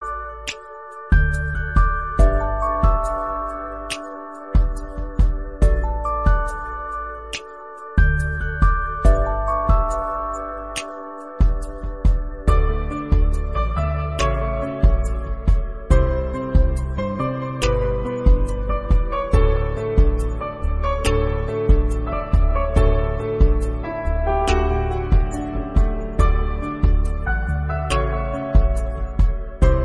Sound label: Flute